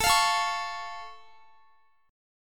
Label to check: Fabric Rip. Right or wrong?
Wrong